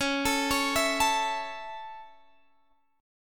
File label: C sharp Minor Sharp 5th C sharp Guitar Open D, chord